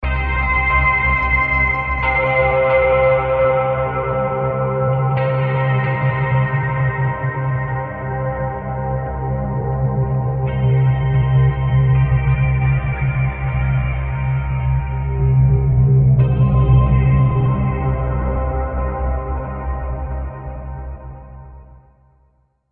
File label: Into Guitar End; ps3; gamerip; Shadows of the Damned